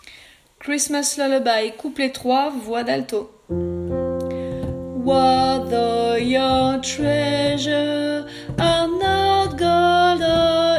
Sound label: Christmas Couplet Alti